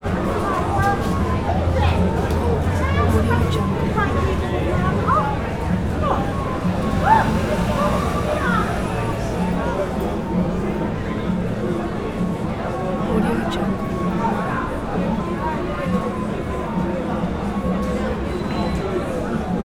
street musician harvard square cambridge ma

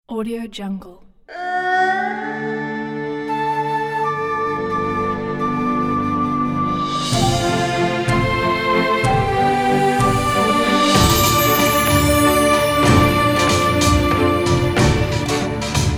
asia china korea orientalism orchestra; 5dollar-music

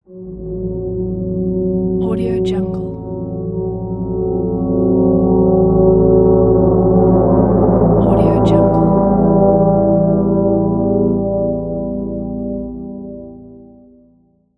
noir mystery and tension

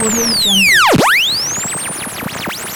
radio jingle

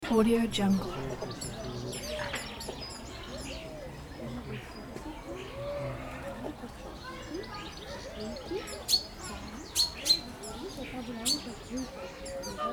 farm peacocks ducks birds people

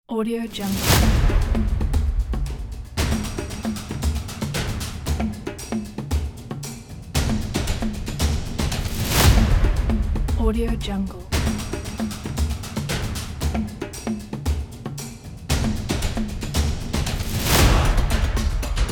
the tribal epic drum

